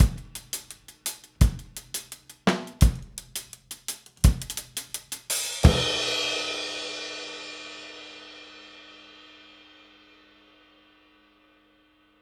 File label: Drums Ending, 70s Analog Funk; Sonic Foundry (Sony Creative Software); What it Is! 70s Analog Funk